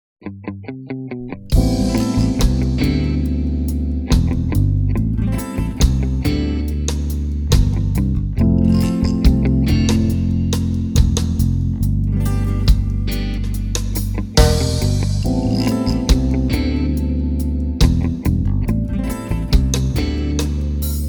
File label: Blue reggae